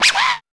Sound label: SCRACH